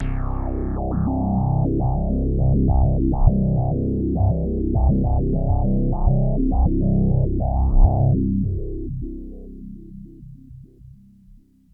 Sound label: Big Synth Basses